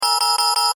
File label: Science Fiction Computer Readout Bell Chime; Computers; SFX Bible 6